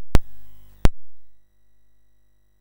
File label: Commodore 64 Christmas BASIC MOS6581R3; Commodore 64 Christmas Album